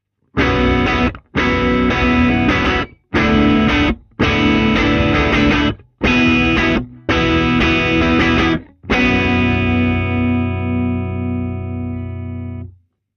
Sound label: Guitar Box - R121 BiDirect; Royer Labs R121; bidirectional (osmička); edge duo srovnavaci test